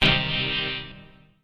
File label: dub, urban instruments